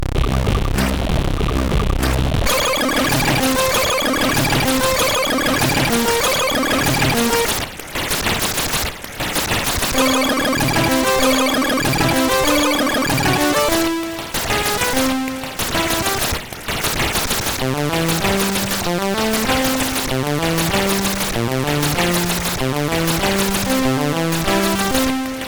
Train in Love, CSG8580R5

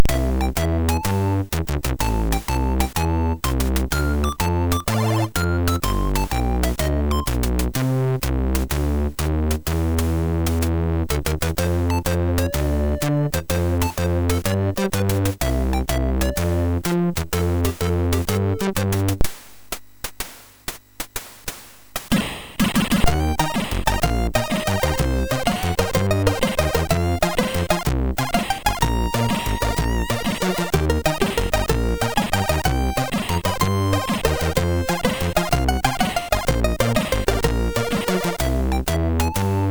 Jazz, MOS6581; MOS6581R3

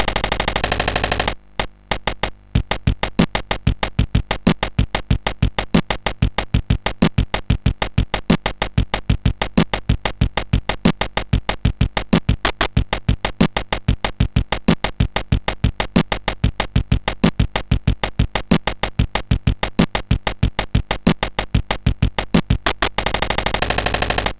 Arkanoid Drums, MOS6581R4

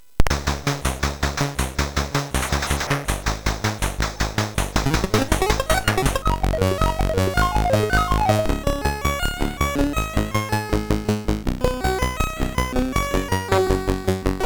Jump Jet, sid MOS6581R4; hvsc

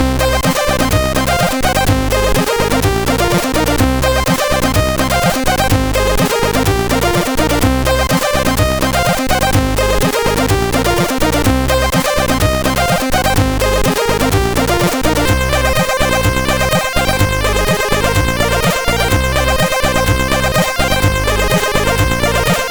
Short Rave beat, 6581

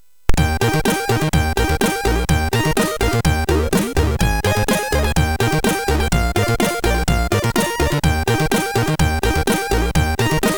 Jinglebells, sid; James Pierpont; MOS6581R3; hvsc

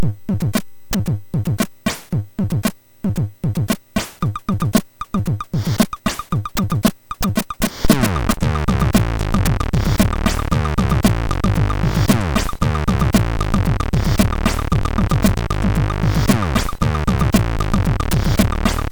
Intro, sid MOS6581R3

